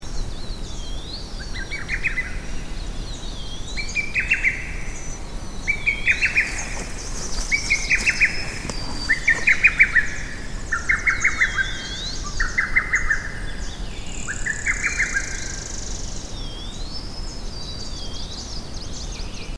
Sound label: Lesser Cuckoo Wawu Sichuan; China 2011